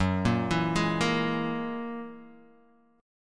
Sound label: Gb 9th Gb down Guitar Open D